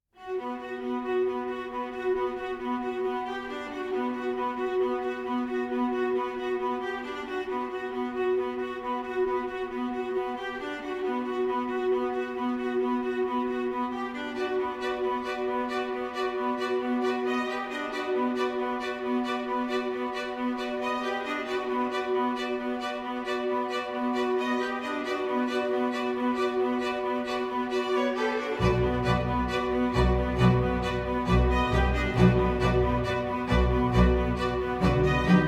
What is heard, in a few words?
Textural Strings Gathering Momentum